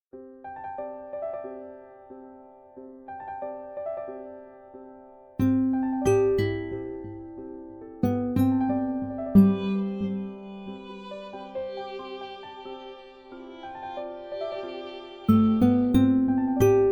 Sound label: Elegant Soothing, alarms